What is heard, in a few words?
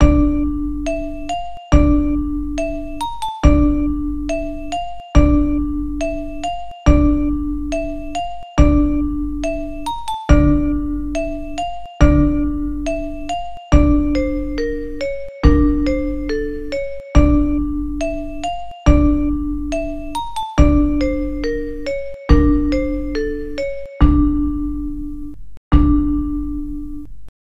game music